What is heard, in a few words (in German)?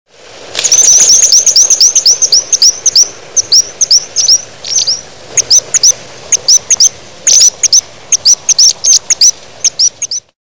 feinsittich